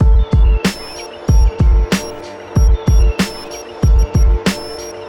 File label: Hiphop; Google; NewWave Labs